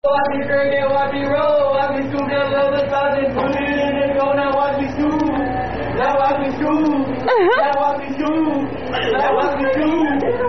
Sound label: Unknown Coldstone Creamery Song